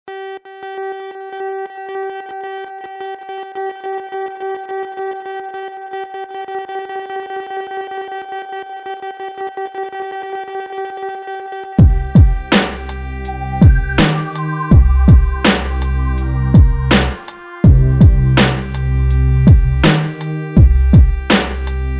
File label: Dub Siren 82BPM